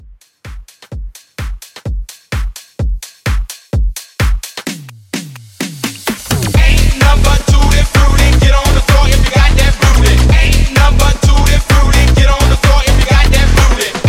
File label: Mixshow Club 128 DIRTY